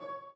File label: b piano